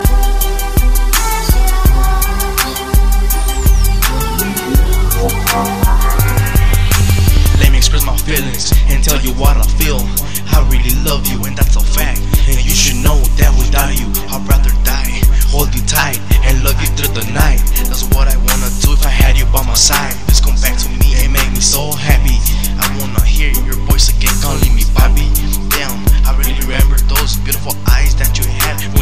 Remix

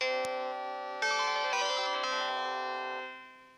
SGH-C100 Shutdown

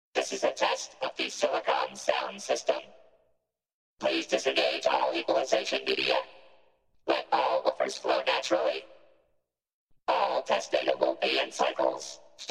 Tone Testing

